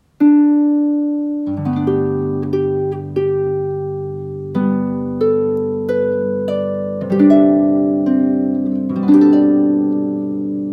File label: harp